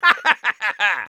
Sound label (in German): Soldier laugh short, de